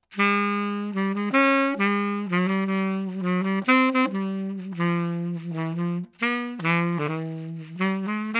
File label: saxophone